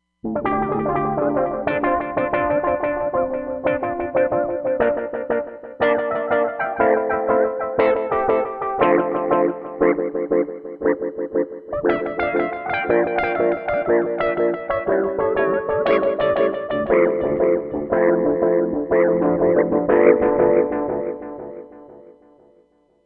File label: Ringy EP, Roland Fantom XR